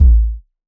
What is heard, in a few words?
Bass Shot